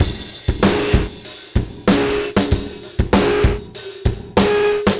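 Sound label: 96 bpm, beat loops; Zero-G; Monster Beats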